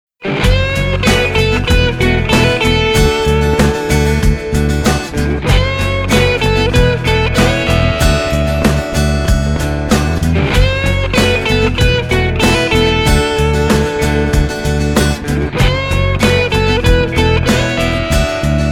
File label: ROCK Stroke APX, guitars; musical-instruments; el-ac-guitars